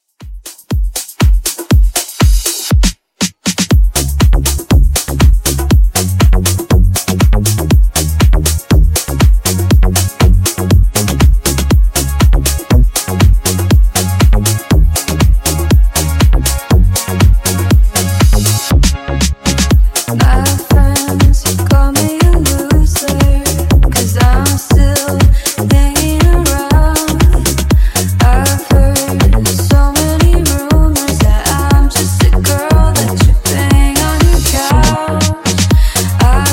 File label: Casual Funk Nudisco Dirty 120Bpm; Edit2